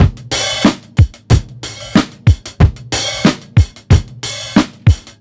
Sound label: BEAT, Roots Funk; USB Soundscan vol.41; AKAI